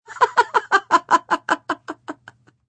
Laugh Chuckle, Female laugh, polite, (human voice); Laughter Sound Effects